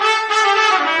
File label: trumpet danger; music